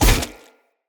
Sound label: Sphere Polearm Medium Impact Flesh; Weapon Impacts; Player; Combat